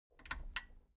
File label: metal button radio push power off